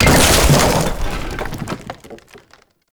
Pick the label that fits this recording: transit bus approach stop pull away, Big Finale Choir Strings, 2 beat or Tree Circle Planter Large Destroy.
Tree Circle Planter Large Destroy